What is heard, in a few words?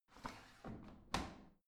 washing machine drawer in soft